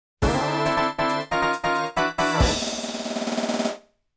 Final Lap Fanfare; mario kart 64 original soundtrack

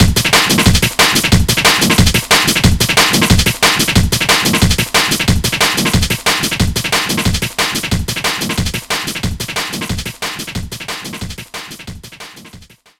Invincibility, Ska Cha Cha; sonic rush original sound version

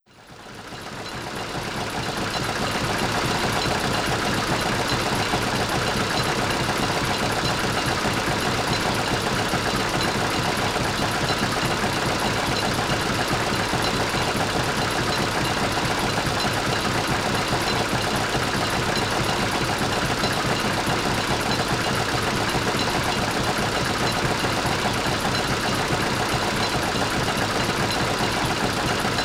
The Station Machine Room; paper mario 2 the thousand year door

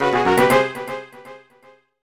Mini Game Shuffle Choice; Vs. Mode; new super mario brothers

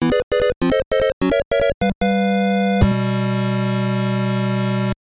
Hurry Up!; super mario bros. 3 1988 nes

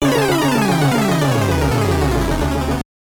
Battle Lost; super mario bros. 3 1988 nes

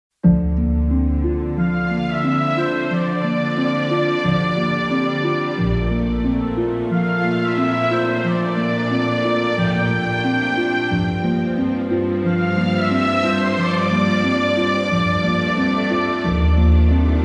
Jingle Create a Bridge; sonic frontiers original soundtrack stillness and motion

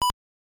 Casino Game Beep; super mario bros. 3 1988 nes

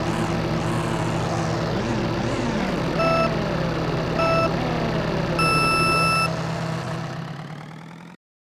Race Start; super mario kart gamerip

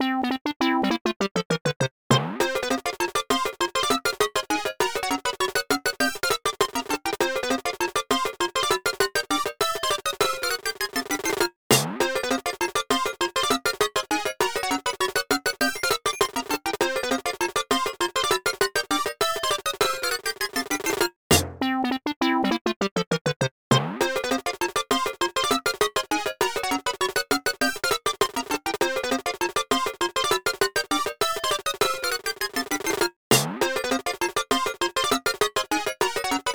Bonus Room (Fast); new super mario brothers

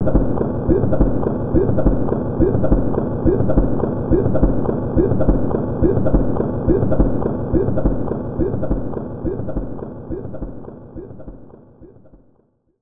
Underwater; super mario kart gamerip

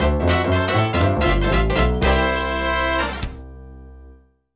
Character Unlocked; super mario 64 ds ds wii u gamerip 2004

